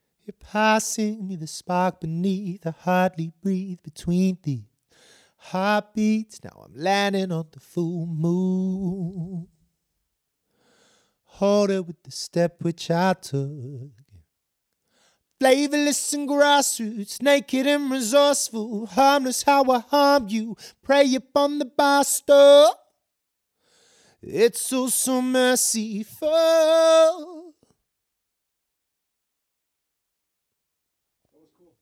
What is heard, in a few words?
mtp 5 stand male mixed